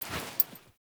Female Foley Action Default Jump, Default Clothing; Characters; Player